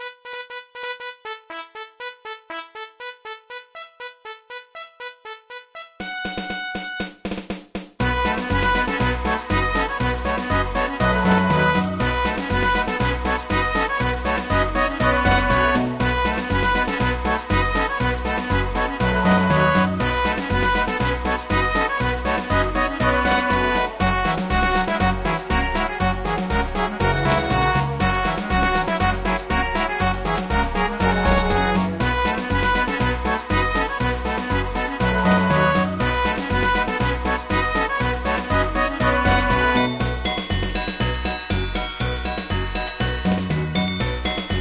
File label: Fanfare; RuneScape